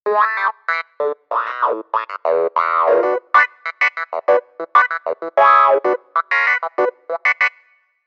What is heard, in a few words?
WAH, Clavier; PC3